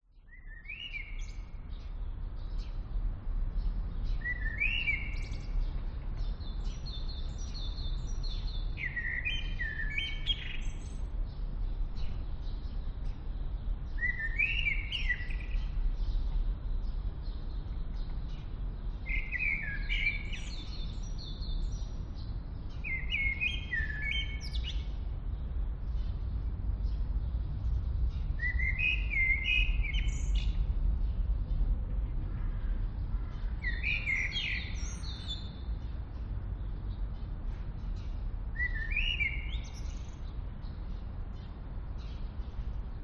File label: Early Morning City Street Ambience; west wolf